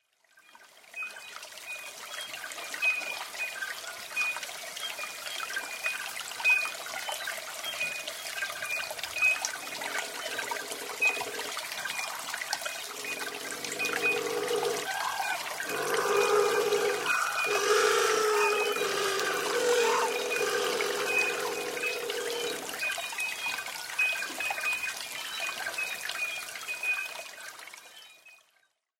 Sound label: Birdsong Blue Penguin